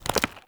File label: Foley Melee Swing; Characters; Enemy; Mockeries